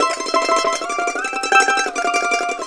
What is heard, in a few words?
COUNTRY